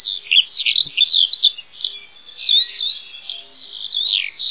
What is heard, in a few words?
alauda arvensis